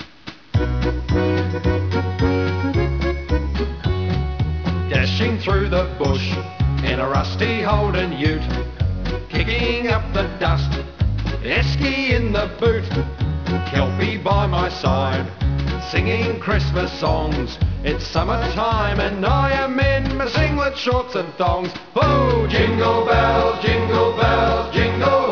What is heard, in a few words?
jingle